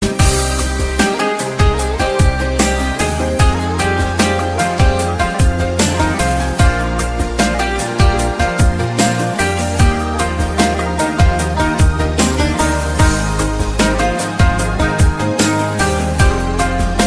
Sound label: very nice melody